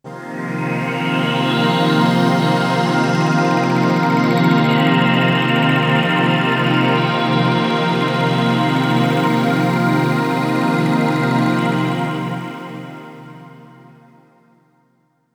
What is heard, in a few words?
pads triton Cmin7; M8; M8JUNGLEPAK1